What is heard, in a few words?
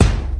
Moombah Kick; Moombah Kit; Hip Hop; STYLE KITS (Hip Hop, Etc)